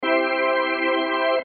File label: Music Organ Chord, Music Organ Chord Stab; Music Organ Chord Major Stab; Sports